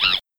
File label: monkey